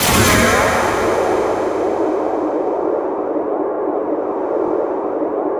HYDRA BLAST; Spectrasonic Distorted Reality 1

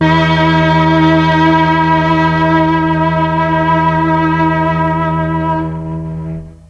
MEL STRING; ILIO; Propeller Island Legendary M400

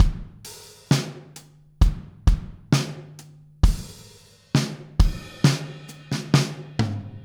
Verse, Full Mix, Drums; Sonic Foundry (Sony Creative Software); Drums from the Big Room The Mixes; Demo Projects

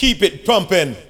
VOX SHORTS; Megabass Remix! 2

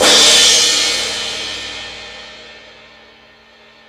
Crash 2, drum machine; E-MU Pro-Cussion-1

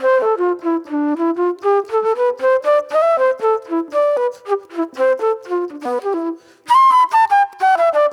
Sweet Soul Flute

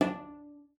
CELLO FINGER D4; Xsample VOL-5

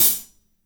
HAT, HI HATS; Spectrasonics; Burning Grooves

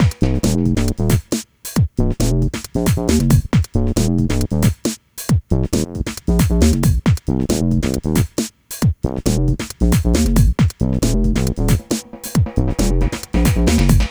Hardcore Breakbeat, 136 Bmin; Big Fish Audio; Hardcore Breakbeat Action; Robotix

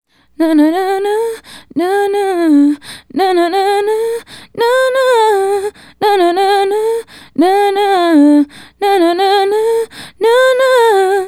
Female Solo, Vocal

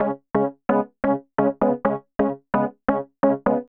Pad Chord, EDM; Sonic Foundry (Sony Creative Software); Afterhours EDM; Ibiza Underground

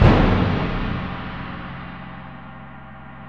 PRC GRONG, Orch Bs Drum

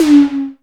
FAT OM, TOMS; Back In Time Records; Big Bang

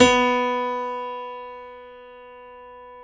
PNO, Grand Pno; Club-50 - Foundations Roland

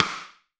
RIM MIX, AMBIENCE RIM

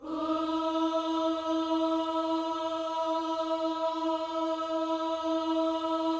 OOS E 4, GOSPEL; Spectrasonics; Vocal Planet

